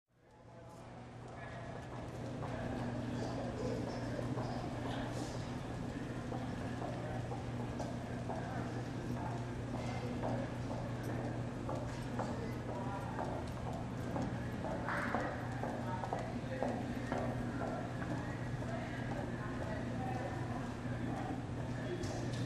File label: Large Room Amb W Activ, lobbys